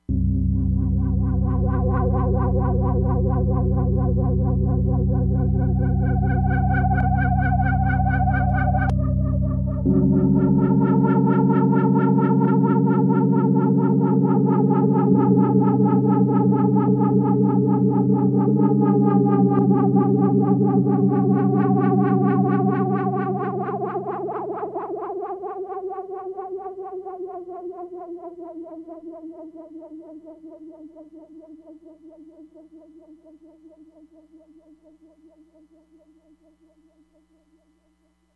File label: EERIE, Crumar Bit 99; Factory Programs